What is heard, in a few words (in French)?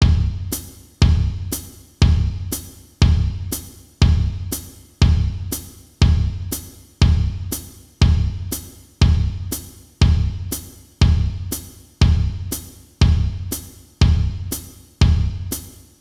Samba 60 bpm